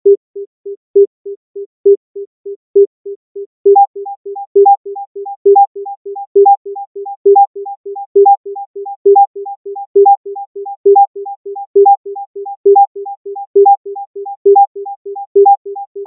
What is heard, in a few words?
Sync offset, rhythm; music